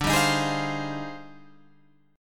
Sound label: Eb Major 13th D, guitar